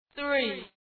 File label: count 3